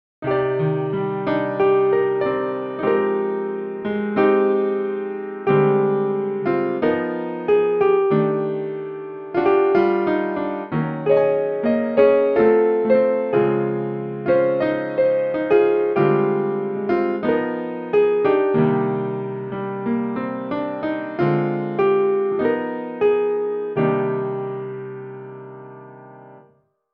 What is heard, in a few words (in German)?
puls piano